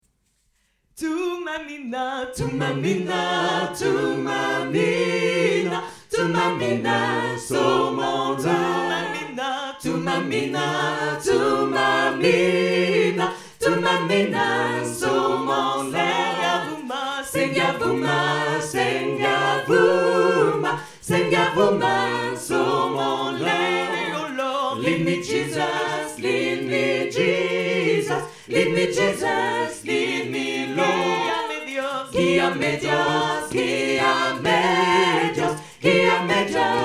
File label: thuma mina soprano; terre habitee world 2023